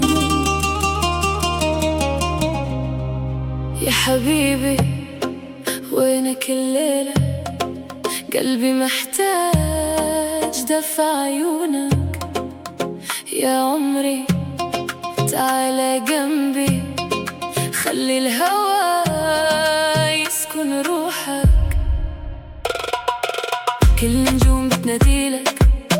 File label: Arabic Afrobeat Remix